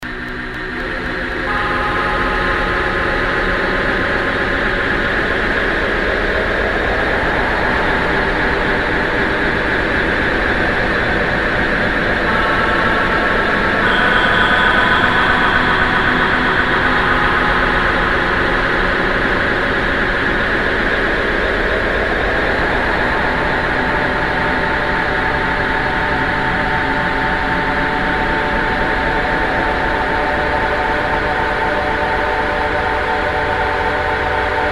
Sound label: Noise